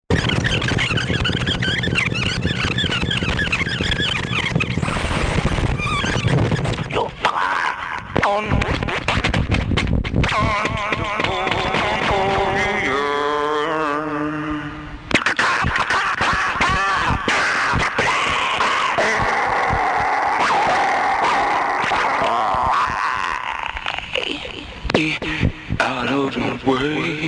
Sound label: electric rag